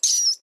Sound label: mice dying; minecraft; technic